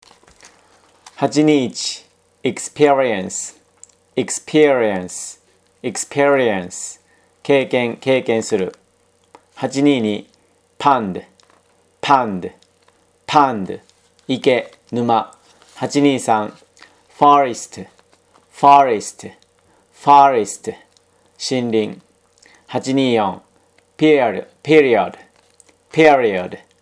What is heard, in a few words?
tango